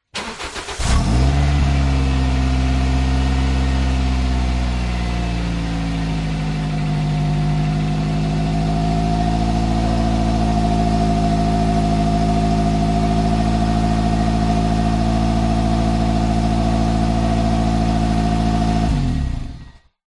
vehicles car start idle off exhaust pov beep at end